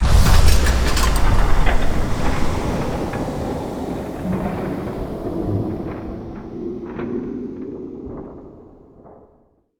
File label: weaver power chamber activate on white flash; Hollow Knight: Silksong